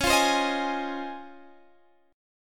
C sharp Major 9th

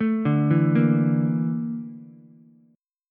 Bb Major 7th Flat 5th Bb Ukulele; BbM7b5 arpeggiated; JGuitar

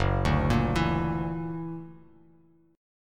F sharp Augmented 7th, Bass Standard; arpeggiated; 2,5,8,9; JGuitar

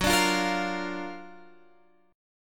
F sharp Augmented Major 7th, down

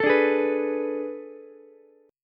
A Major 7th Suspended 2nd Suspended 4th, Ukulele; AM7sus2sus4; strummed; JGuitar

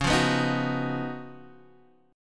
Db 11th Db, Guitar, DADGAD

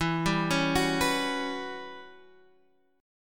E Minor 6th Add 9th guitar chord, Half Step Down; Em6add9

